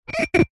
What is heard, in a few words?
foley glass rub squeak finger single; zapsplat